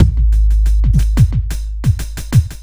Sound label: Smooth, R&B Drums